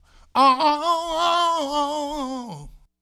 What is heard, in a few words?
Vocal, 70s Analog Funk; Sonic Foundry (Sony Creative Software); What it Is! 70s Analog Funk